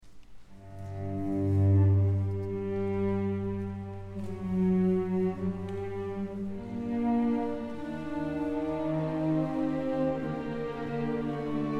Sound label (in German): Kammerorchester